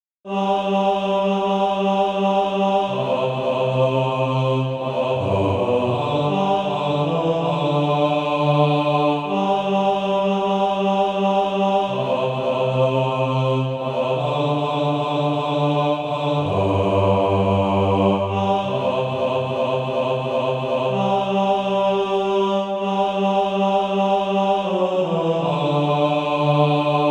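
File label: bass, SATB Practise Music; Christ Is My All in All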